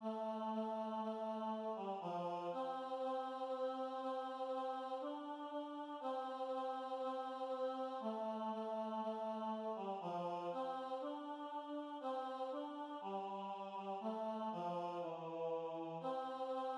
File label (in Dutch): Hoor de englen zingen tenor